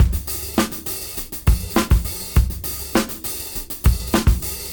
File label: Tricky 101 BPM; AMG - Keith LeBlanc Phat Butt Jams